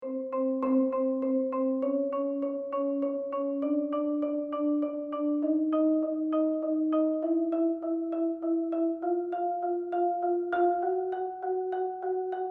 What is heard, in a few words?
Front Ensemble 6-3-2-1 Warm-up, Percussion; Racine Scouts 2017